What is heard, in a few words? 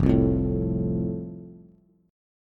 F Major, Bass; strummed